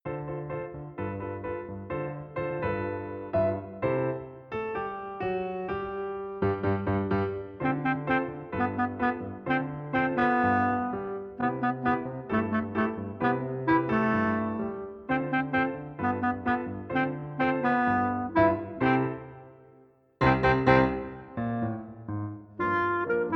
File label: chimpanzee cha cha